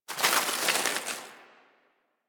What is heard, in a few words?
Exterior Forest Critter